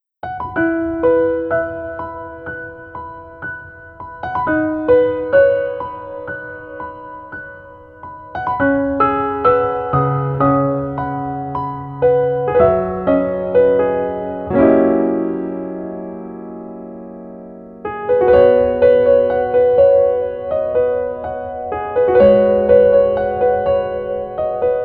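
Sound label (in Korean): Piano version 멜로디 MR 여자키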